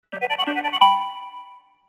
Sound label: Receiving Message; Luigi's Mansion 3